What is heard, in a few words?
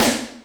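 Snare, Alesis D4 drum machine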